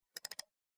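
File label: Low pitched Mouse Single, mouse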